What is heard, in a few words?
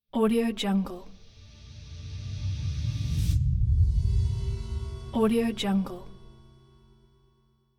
cinematic tone; arashdarehshouri